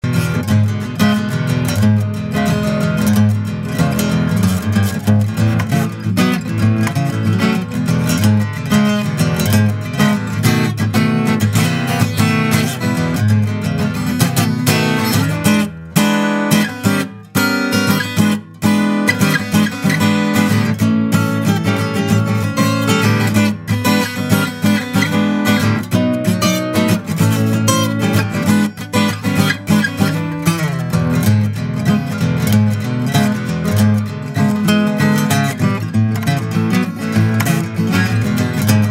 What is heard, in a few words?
Guitar Sample